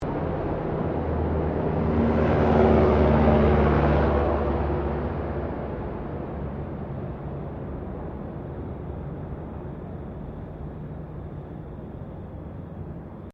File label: HELICOPTER 2007 AS-350BA SQUIRREL PASS; RAN Squirrel Helicopter; Helicopters; Vehicles; Aircraft Collection